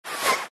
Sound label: shell whizz